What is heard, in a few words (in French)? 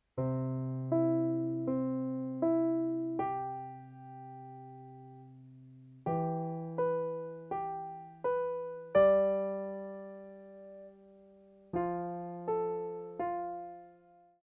improvisation, piano